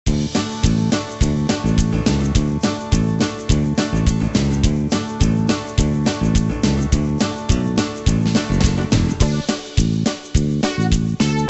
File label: Music Game; farm frenzy animal country; nds